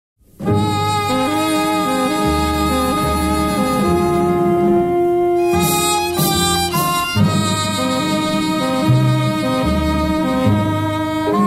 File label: Off Beat